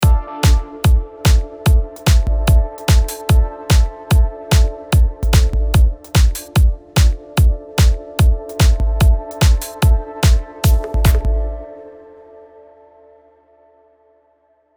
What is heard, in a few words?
PadLoop dry; Cameleon Labs 7721